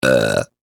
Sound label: Human Male Burp; Burping